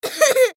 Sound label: Human Cough; Cough Male Ten Yrs Boy; Sick; Blastwave